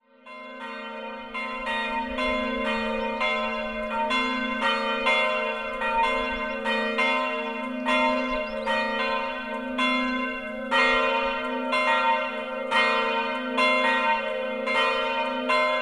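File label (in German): Glockengeläut; St Aldegund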